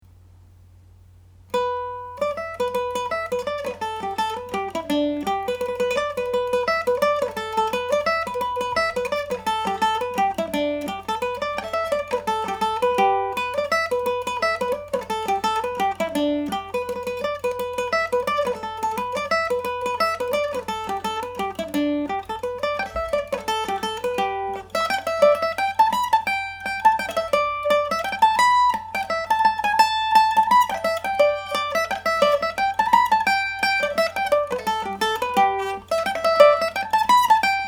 george whites favourite, Irish Mandolin

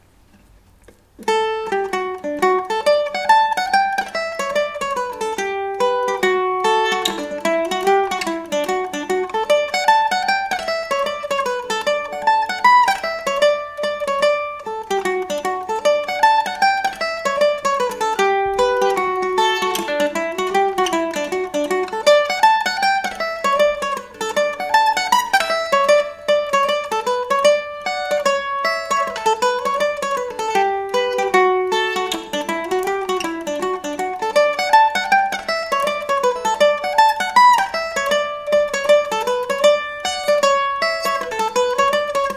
liverpool hornpipe, mandolin

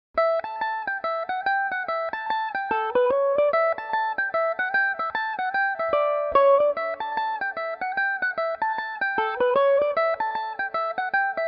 jig away the donkey, mandolin

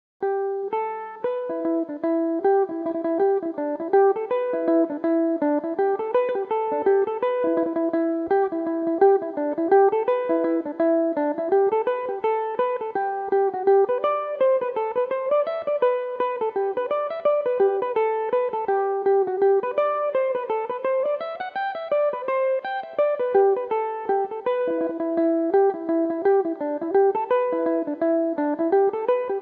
E minor, Irish mandolin; Drag Her Round the Road

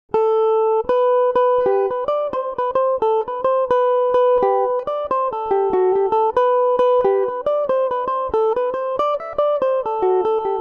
humours of ennistymon gmaj mandobird; Epiphone Mandobird VIII electric mandolin

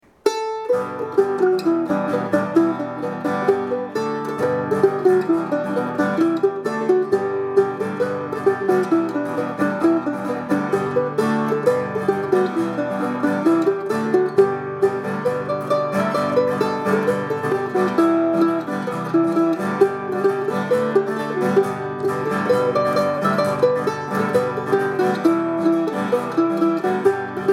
polkas with guitar, Irish mandolin; The Castle Danger Polka Number 1/The Castle Danger Polka Number 2

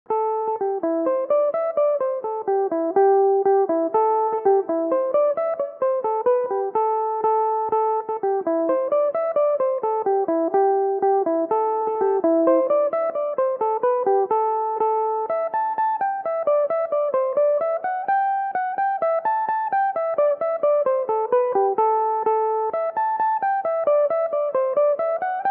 polka A dorian, Irish mandolin

